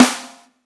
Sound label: subtle reverb snare drum sound b key